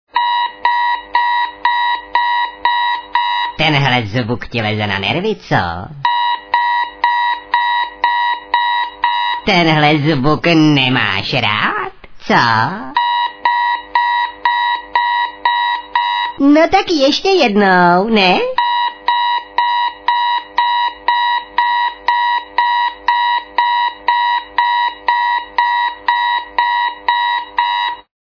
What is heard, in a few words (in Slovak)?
budík